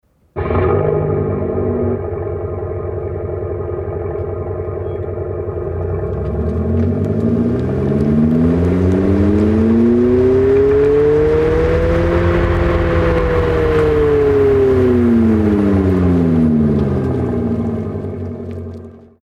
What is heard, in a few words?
Abarth sound 500e engine start away on board mic; esterno